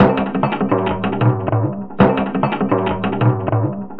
Mooli Djembe Ud, Acoustic Beats; Sonic Foundry (Sony Creative Software); Myths of Technology

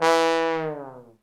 TROM LNG FALL

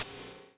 NEW ROOTS REGGAE; Bass; Sonic Foundry (Sony Creative Software); Not Just Another Pretty Bass

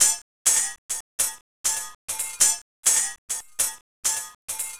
Finger Cymbal Beat, Acoustic Beats; Sonic Foundry (Sony Creative Software); Myths of Technology

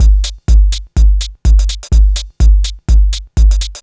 loop 125bpm, House Drum Loops; E Lab; XTc Files of House; 122-134bpm

